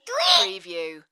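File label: Toddler Tweet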